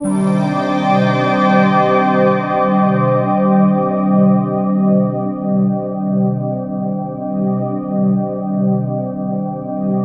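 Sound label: soundtrack, ethereal atmospheres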